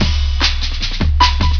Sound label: beat